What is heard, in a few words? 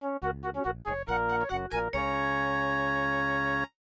MIDI Animation stings